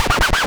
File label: SCRATCHES; Scratch 1